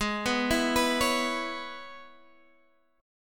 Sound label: E 6th, G sharp